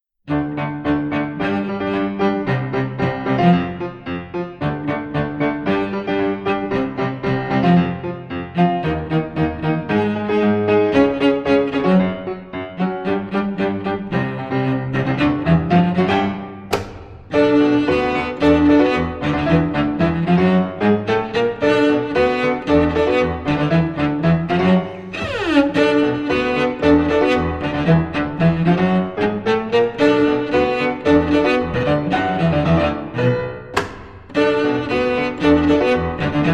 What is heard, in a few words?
Disco Hit; We wish you a merry christmas